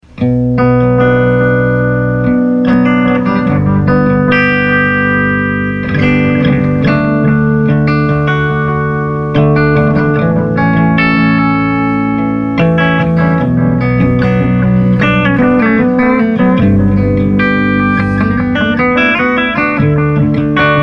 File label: Analog Delay